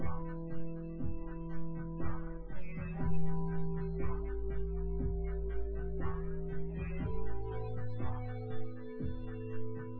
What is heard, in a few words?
wdrums synthconv